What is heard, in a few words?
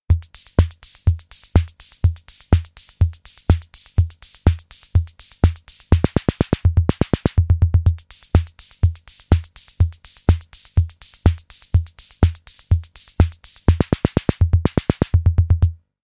SR-88 PATTERN